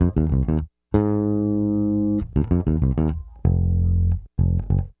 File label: Bass, 70s Analog Funk; Sonic Foundry (Sony Creative Software); What it Is!